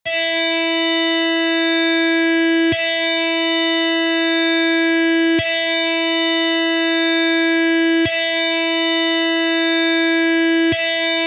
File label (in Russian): struna Zvuki strun elektrogitary